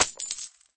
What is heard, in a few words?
drop gold